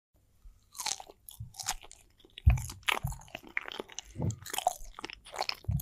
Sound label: eating bananas